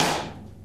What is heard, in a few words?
subtle reverb snare drum sound g sharp; Duration 0:01; Samplerate 44100 Hz